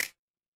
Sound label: cartoon stretch